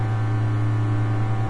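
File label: generator room stereo loop; video game; Left 4 Dead; Source Engine; ambient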